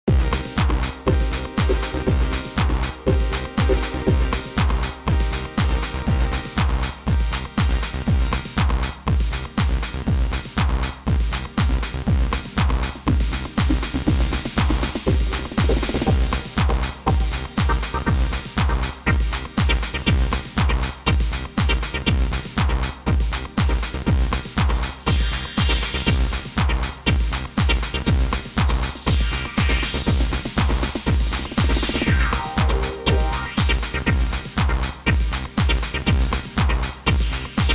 Organ Filter Chord Stab Bass TR Drum no FX

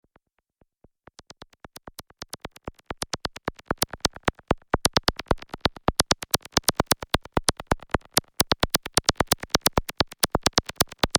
kobol zapp

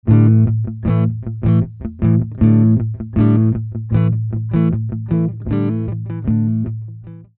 rock riff

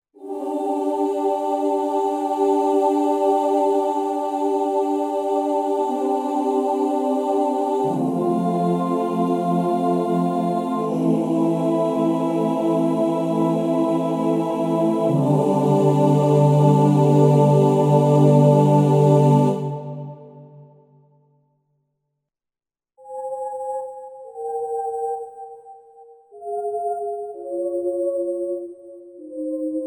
Yamaha PSR SX700 SX900 Pad Sounds

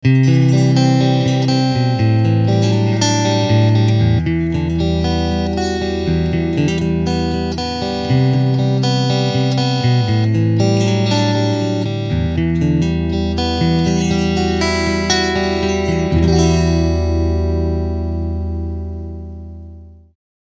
Clean Picking

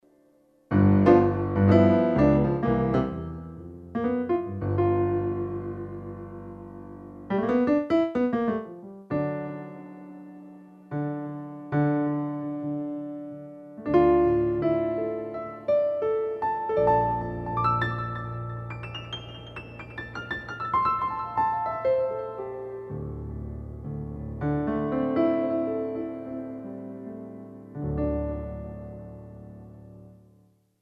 Casio Privia PX-5S piano

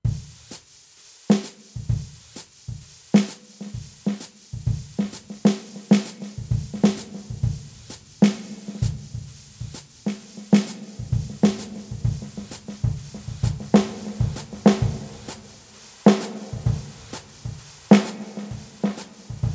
Jazz Sticks Kit

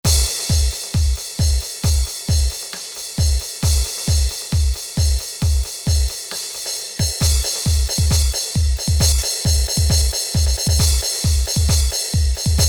Preset Pattern

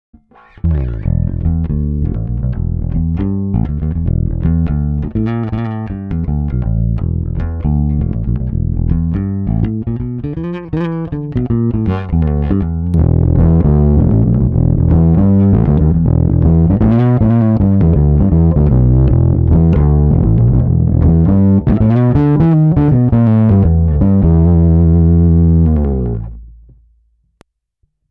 RW Factotum Fingerstyle

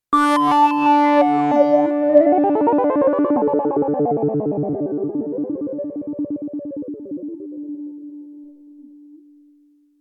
Sync FX

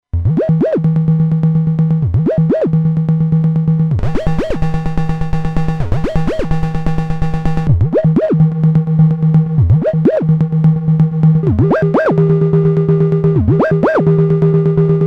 Acid8 MKIII 4x Triangle

